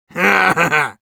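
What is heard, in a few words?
laugh evil, Russian; Medic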